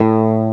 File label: Victory loop, SNES; Cyber Knight